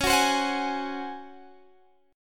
C sharp Major 7th Suspended 4th C sharp; C#M7sus4; strummed

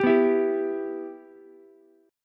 B Suspended 4th Sharp 5th B Ukulele; Bsus4#5 strummed; JGuitar

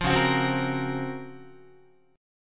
C sharp Major 13th, Guitar; C#M13 strummed; JGuitar

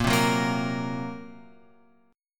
A sharp Suspended 2nd Sharp 5th A sharp; A#sus2#5 Chord {6 3 4 3 X 6} strummed

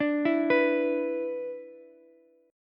B-Minor Double Flat 5th, Ukulele; Bmbb5 arpeggiated; JGuitar

